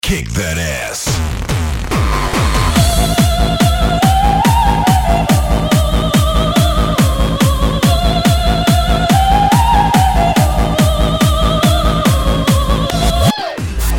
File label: Hardstyle